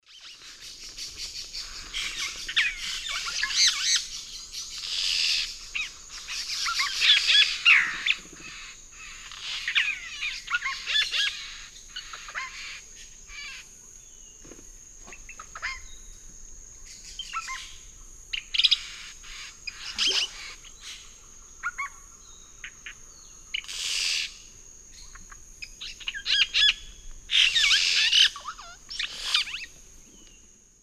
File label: Cacicus cela, calls from colony; S. America; Eastern Lowlands